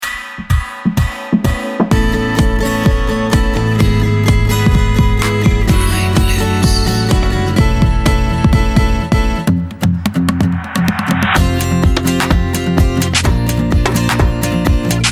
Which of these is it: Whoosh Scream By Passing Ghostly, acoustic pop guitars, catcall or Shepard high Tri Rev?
acoustic pop guitars